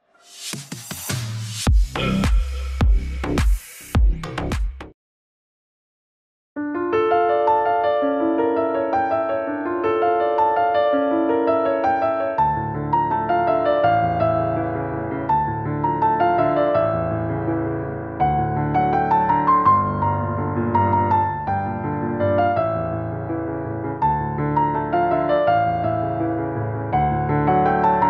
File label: piano; приключения электроника